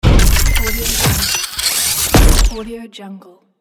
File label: glitch transformer